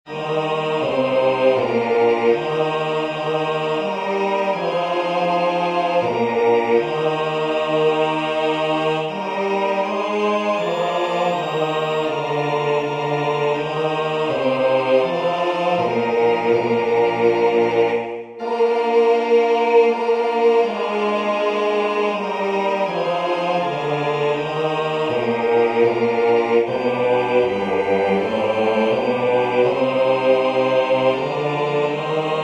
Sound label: Offertory bass; singing divine service iii in parts